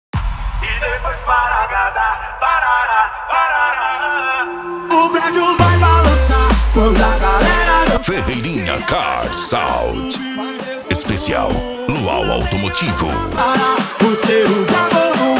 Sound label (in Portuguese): lual automotivo; ferreirinha car sound